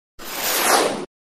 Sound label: Synth Laser Single, laser